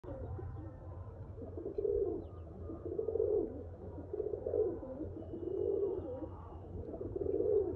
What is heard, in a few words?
pigeon